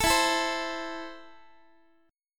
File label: E Suspended 4th Sharp 5th E, down, Guitar; Esus4#5; strummed